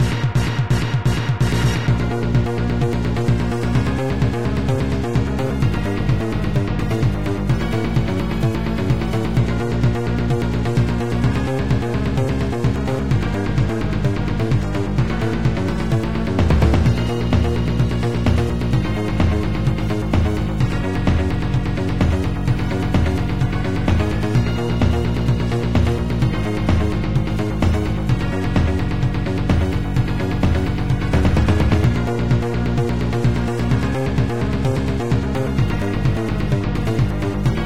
Music; Biometal JP

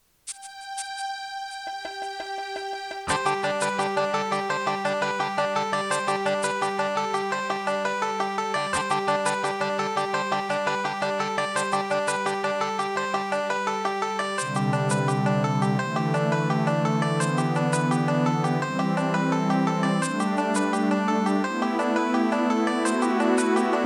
unused music track; Zombieville 1997

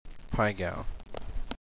pai gow intro; Bicycle Casino

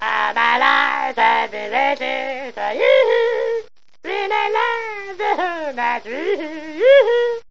BONK SONG FX; Town No Name

